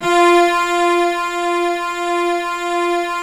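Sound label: C BASS F4 right; SOLO C. BASS; Greytsounds VOL-1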